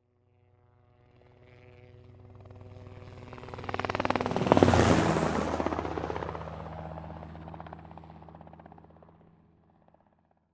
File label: Transportation Helicopter Fly By Quickly